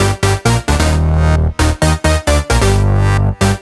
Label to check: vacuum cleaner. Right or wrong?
Wrong